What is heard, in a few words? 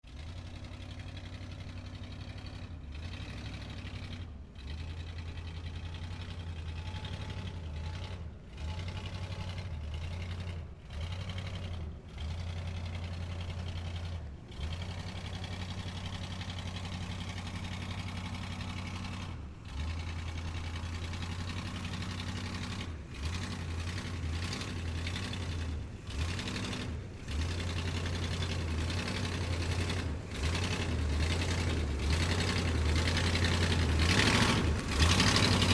Truck By Slow Lurch